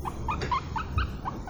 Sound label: low w, degu